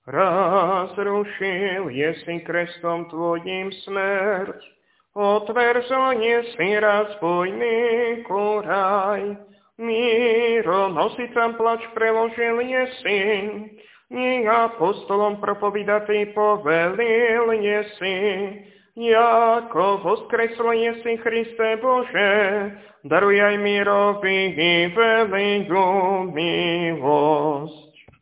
Tropár voskresný, hlas 7; nedelna-skola

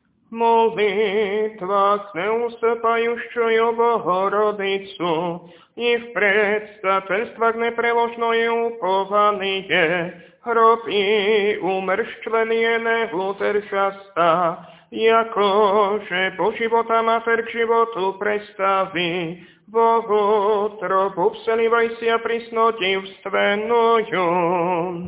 Kondák Uspénija hlas 2; nedelna-skola

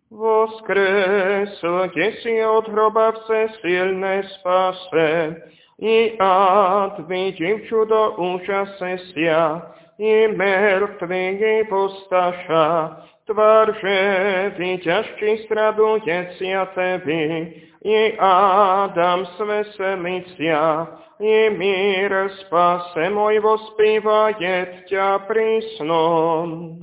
Kondák voskresný hlas 2; nedelna-skola

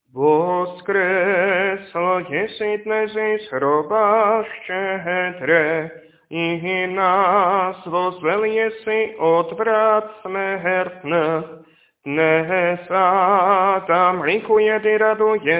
Kondák voskresný hlas 3; nedelna-skola

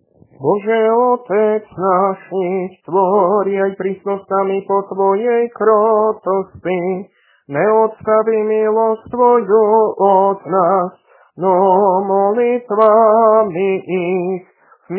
Tropár svätiteľom, hlas 4; nedelna-skola

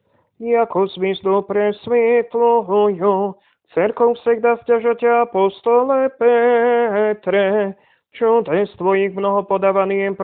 Kondák apoštolovi, hlas 4; nedelna-skola

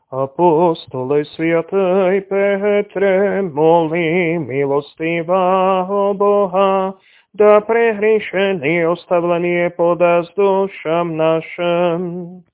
Tropár apoštolovi hlas 3; nedelna-skola

